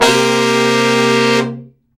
BRA SECT; BRA SECTIONS

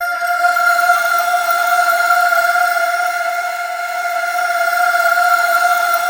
PADS; OMNIVERSUM; Masterbits - Tekkno Trax; SYNTHFX+PADS

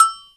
ROCK PERC.

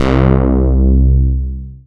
SYN PROPHE, Analog; Sound & Vision - Gigapack I CD 2 (Roland)

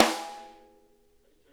SNARE rim; Sonic Reality; Interactive Drum Kits

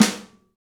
Pop Snare; Snares

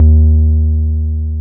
303 MUTED EB2, ROLAND TB303; Big Fish Audio; First Call Vintage Keyboards

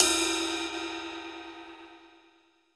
Folk Ride, Cymbals; Roland BK-5

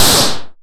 Cymbal Clean Noise, Modular; Wiard 300 Series

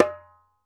ASHIKO, African Drum

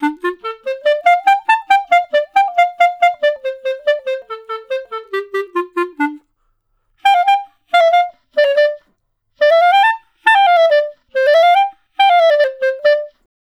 Clarinet Solo

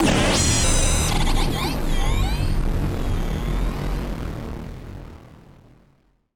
Malfunction Synth, malfunctioning synth samples

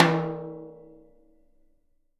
Living Drums, JZ XTOM 1

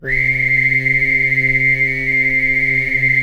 WHISTLIZR, HUMAN SYN; ILIO; Vocal Planet VOL-3; Jazz & FX

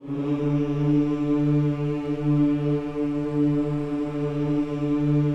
HMMS D 3A, Gospel; ILIO; Vocal Planet VOL-1

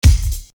Bass Dr, EuroDance Bdrums

